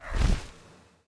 elec bird idle

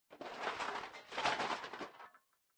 desert; forgottenruin

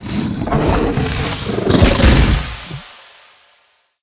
seed frog; monster

